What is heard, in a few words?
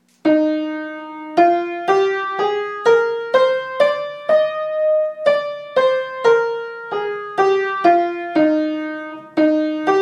E flat scale and arpeggio